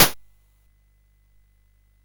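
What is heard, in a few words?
Nebulus, MOS 8580 SID chip music, games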